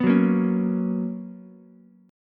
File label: B Major 7th Suspended 2nd Ukulele, chord